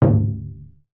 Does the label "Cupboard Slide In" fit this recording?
No